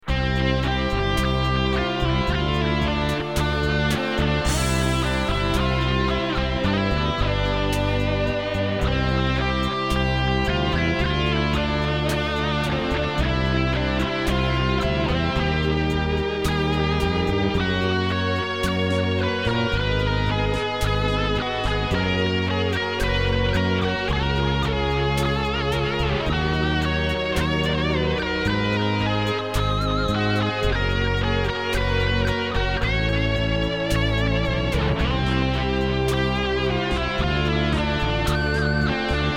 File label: GUITAR SOLO